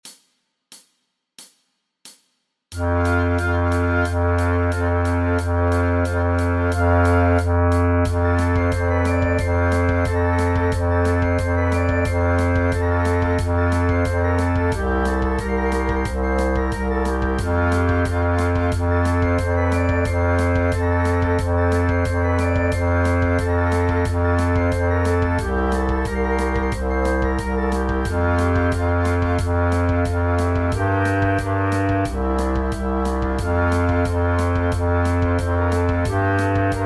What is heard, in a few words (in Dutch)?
oefentracks contrabas